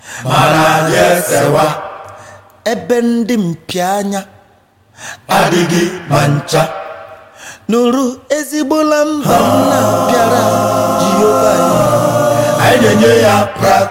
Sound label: Acapela